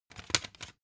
cd take out

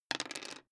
dice roll table

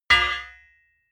hammer bounce ring

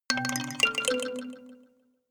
notification kalimba delay sequence ascend

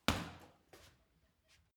punching bag kick medium

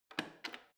plastic button creak press

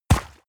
horse step water shallow

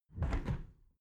window close regular

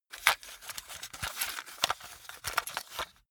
cassette rattle long

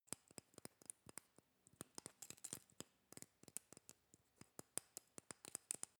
scissors handle quiet

